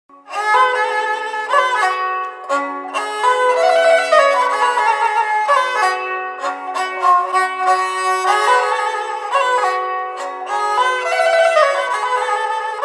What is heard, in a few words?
ghaychak; قیچک